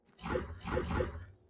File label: climb up ladder; HLD